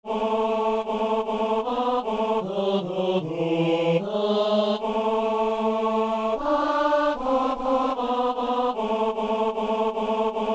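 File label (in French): ténor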